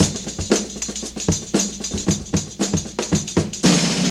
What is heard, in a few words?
117 bpm hq breakbeat sample g key, Free drum loop - kick tuned to the G note